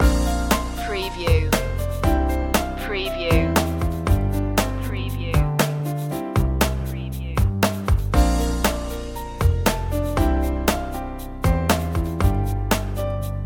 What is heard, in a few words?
loop, chill